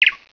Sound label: CHIRP